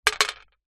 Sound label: leisure game plastic pigs x2 throw onto plastic board; pass the pigs; zapsplat